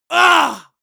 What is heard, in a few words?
male combat hit hard; soundpool